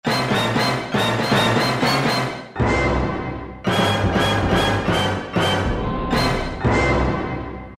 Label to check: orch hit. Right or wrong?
Right